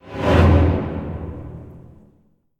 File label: Fight Music Fade; Sherlock Holmes The Devil's Daughter